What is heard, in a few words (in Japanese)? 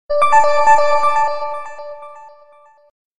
メッセージトーン